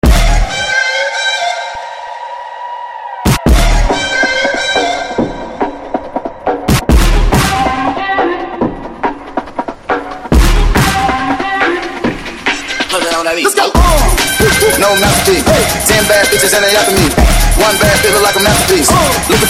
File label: bootleg